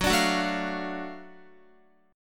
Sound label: F sharp Minor Major 7th Sharp 5th F sharp down Guitar; strummed